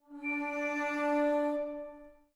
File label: SFX SOLO mix; Journey